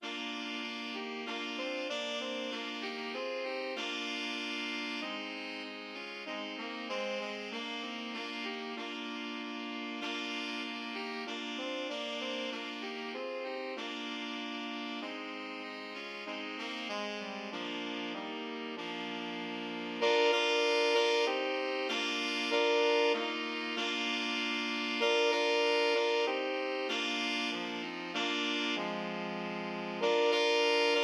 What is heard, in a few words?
sax trio; saxophone trio; promenade